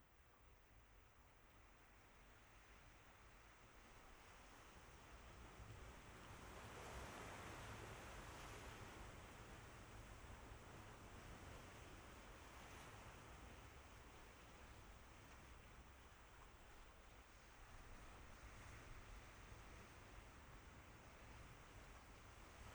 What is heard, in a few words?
Ocean Wind; HMN Library